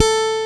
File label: PICK A3 AG, GUITAR; AKAI S-Series CD-ROM Sound Library VOL-8